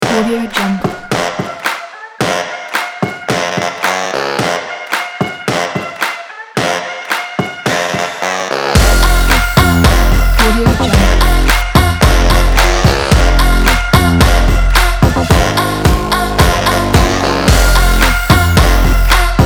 dirty sexy beat fashion sport

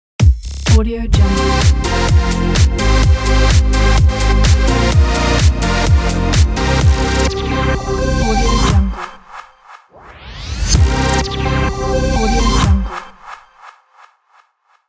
dancing jingle

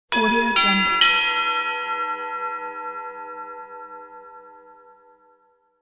mass liturgy gongs triple

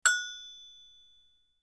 agogo bell single hit

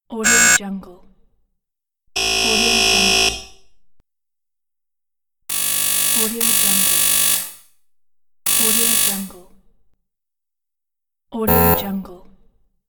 door buzzers

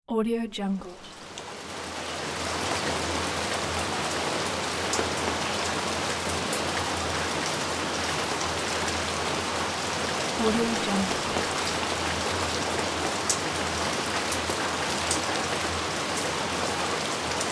rain on street

